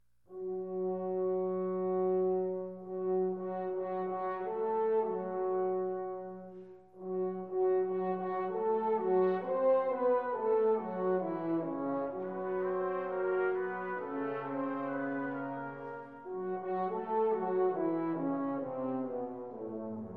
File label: Concerto for Trumpet, Brass Ensemble, and Percussion - Adagio